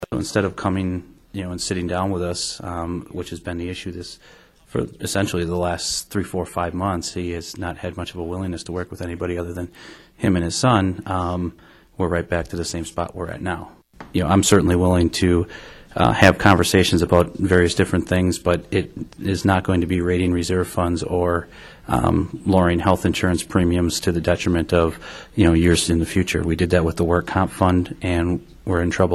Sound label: Hammond